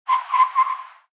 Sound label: car braking skid